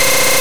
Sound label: Aurora Beam Direct; sfx; attack moves; Pokemon; gen 1; rby